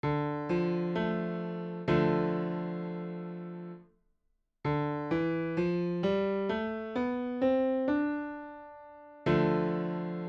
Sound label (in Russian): dorijskij zvuk; Часто встречается в народных мелодиях, роке и джазе.; Пример: ре–ми–фа–соль–ля–си–до–ре.